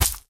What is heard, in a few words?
Character Bounce; angry birds